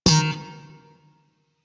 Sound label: Shawzin Slap Oct Three D Sharp, Chords; Music; Warframe; Sentient Shawzin